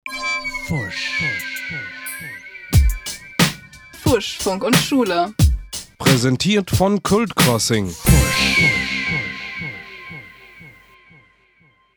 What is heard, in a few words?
Jingle; KultMedien; KultCrossing